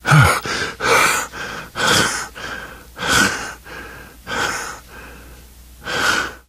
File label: exertion minor